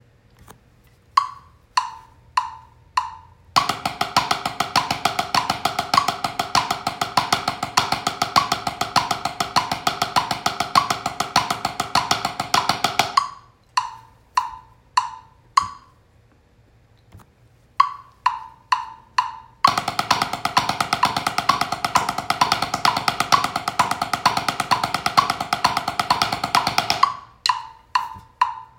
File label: doubles paradiddles, drums